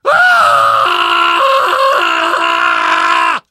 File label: fall, survivor voice